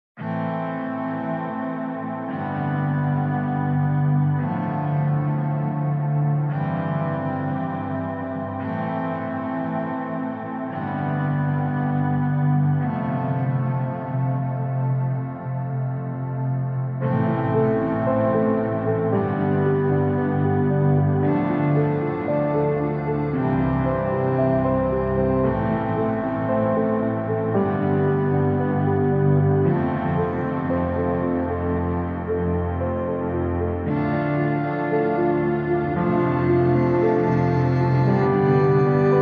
Orchestra

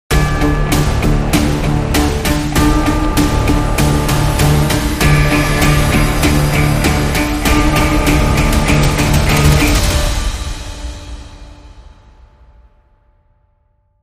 Crime Sting

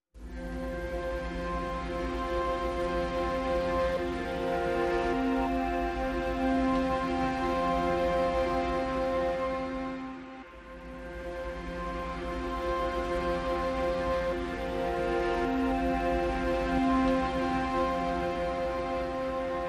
Legato Strings Mix

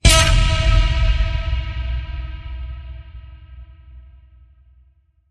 Rubber Ducky

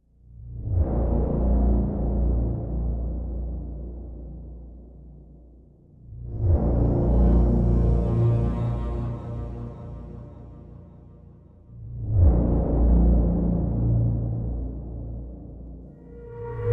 No Perc

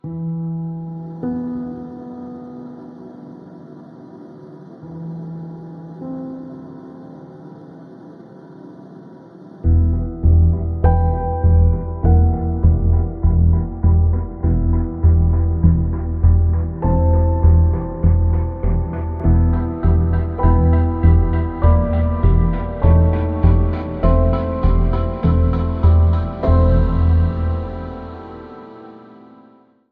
No Perc

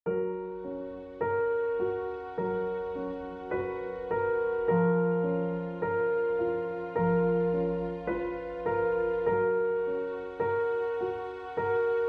Piano and Strings

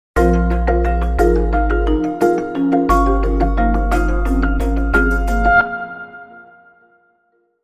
Short Sting

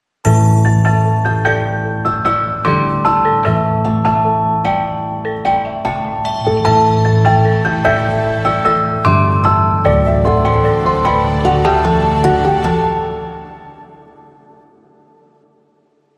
Sting Sparse

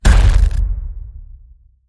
Static Buzz Hit

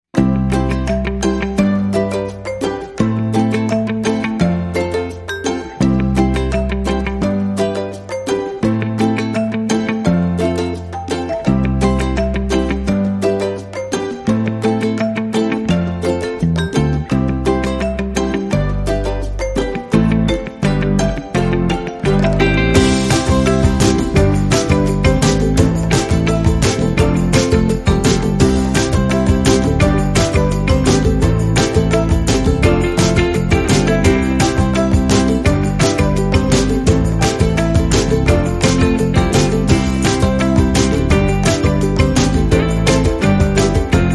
No Piccolo Flute No Bells

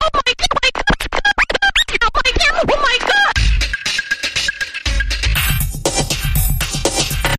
Chatterbox Electronic Bumper

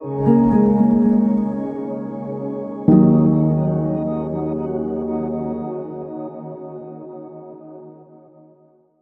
Link Sting

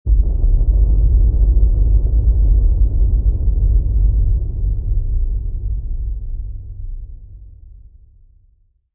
Thunder Boom

